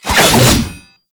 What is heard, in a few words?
Bayonet Melee Spin Whoosh; Warframe; Tenno